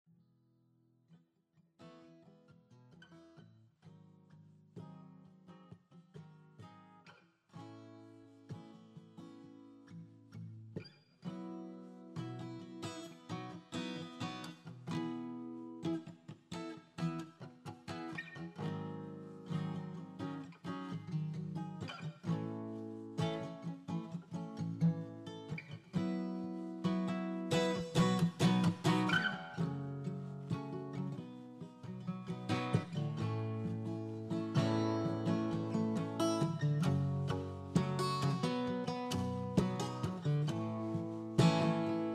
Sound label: riff music; Restream